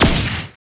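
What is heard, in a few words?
FG SHOTS, future gun; Total Control - Action Cutz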